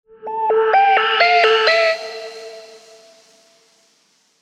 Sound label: Synth Fills A (128 BPM)